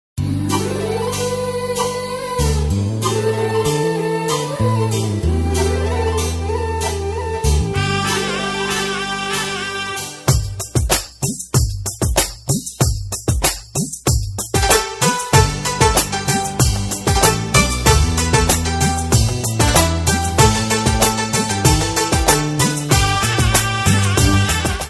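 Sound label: background music Telugu Ringtone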